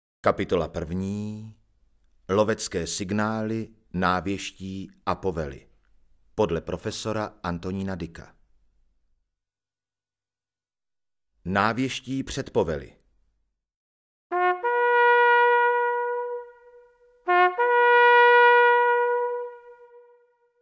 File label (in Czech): Pozor, troubeni; desatero